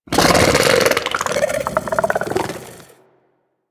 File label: bug vocal tranquillized; Warframe; Hunting Critters; Inf K Drive Bug